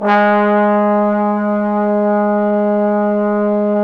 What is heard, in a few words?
BRS FF BON, Bone Sec.1; Roland L-CD702